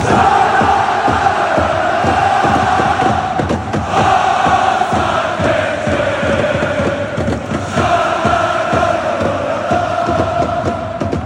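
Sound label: chalala, football songs and soccer chants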